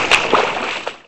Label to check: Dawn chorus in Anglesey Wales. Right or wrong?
Wrong